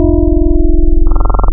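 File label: FM piano, Classic Synths wavetables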